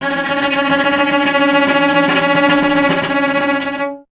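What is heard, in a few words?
strings cello C#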